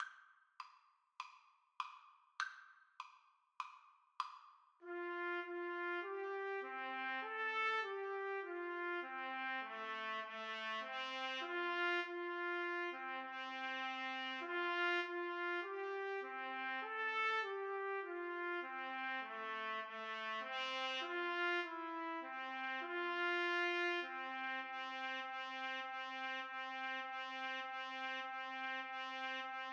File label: trumpet duet